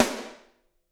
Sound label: SD, Drums; Bob Clearmountain Drums I